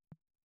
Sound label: tom; MFB-522